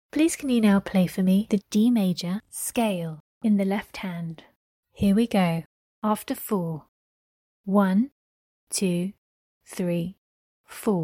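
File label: D major scale left hand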